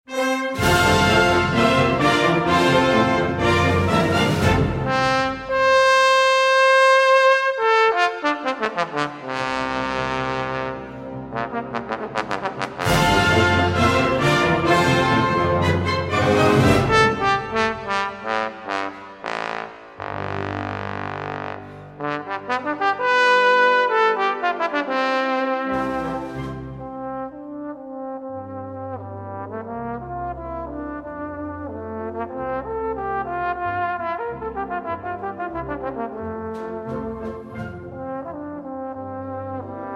blue bells of scotland trombone theme and variations